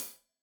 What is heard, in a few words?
Hat Tight, Hi-Hat, Cymbals; Deeply Sampled Drums